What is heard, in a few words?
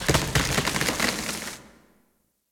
Hub Tree Creak; Ambience; Warframe; Tenno Hub